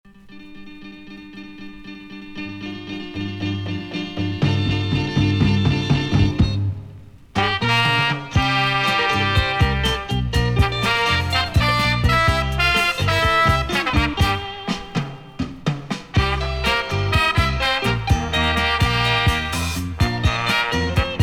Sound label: ukrainian funk 70 80 vinyl only